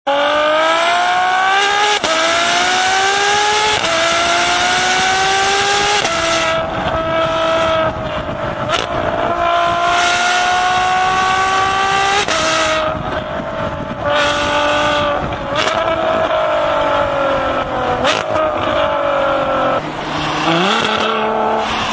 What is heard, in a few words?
ferrari f12